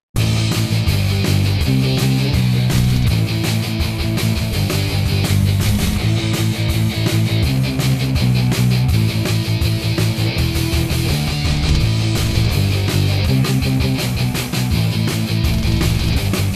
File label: metal